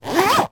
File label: handbag zipper; foley